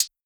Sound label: hat dual, Closed Hat; Hip-Hop; Drum One Shots; SD CAICOS Hip-Hop Sample Pack; Slate